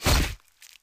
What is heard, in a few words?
Knife Crit; Scrap Weapons; Zomboid